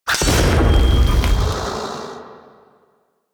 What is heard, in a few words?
Spear Impact; Warframe